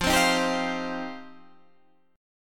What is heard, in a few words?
F 7th Suspended 2nd F down